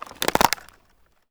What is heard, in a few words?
wood branch break; anemoi